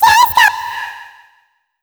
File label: DISCO, Funky Vocals, SHOUTS; USB Soundscan vol.18